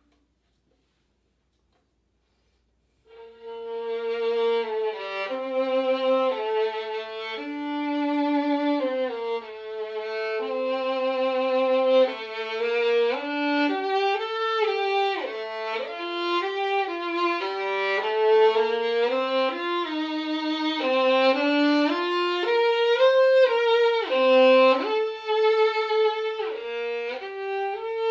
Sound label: Violin Elgar